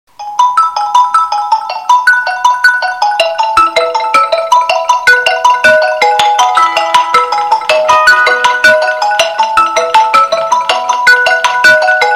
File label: Xiaomi Mi Remix Ringtone